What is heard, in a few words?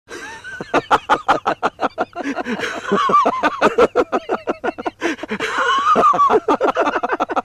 two uncles laughing; audio meme